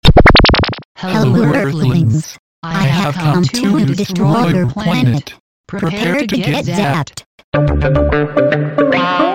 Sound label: Alien Zap